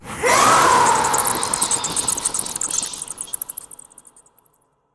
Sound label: Vampire Disapear